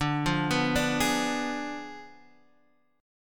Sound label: G sharp Diminished 7th